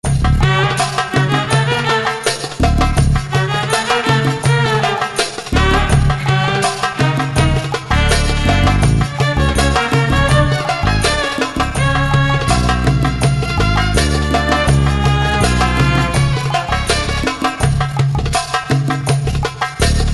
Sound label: erhu mideast